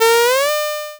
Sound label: racing car